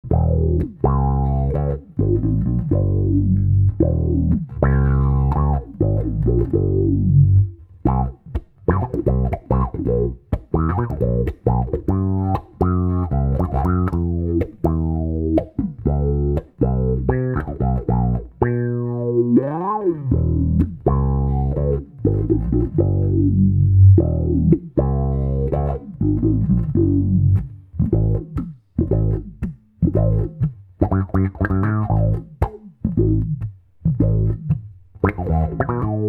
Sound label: MXR m82; Effects; AutoWahs